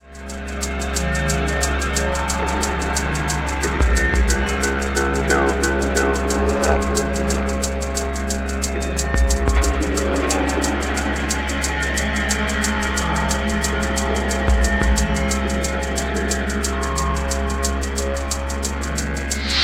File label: escape, ambient